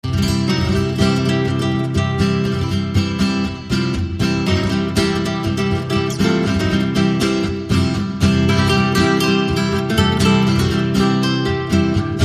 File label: No Ukulele